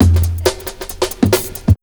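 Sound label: Big Beat Drumloops, loop, right; USB Soundscan vol.25; AKAI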